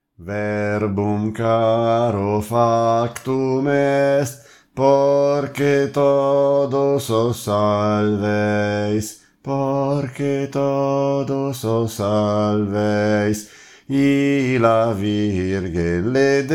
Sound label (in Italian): contralti; Verbum caro